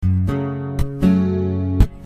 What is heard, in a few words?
Punchy Gbm 122